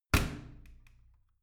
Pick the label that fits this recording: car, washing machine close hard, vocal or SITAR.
washing machine close hard